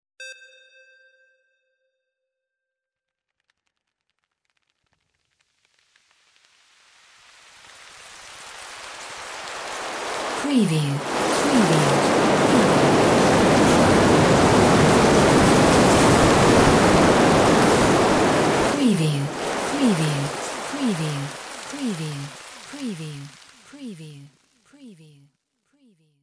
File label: BATS SWARM